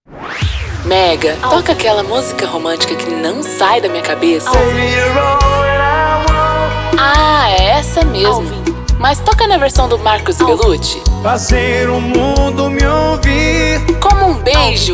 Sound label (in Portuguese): INTRO MUSIC; MEGA FM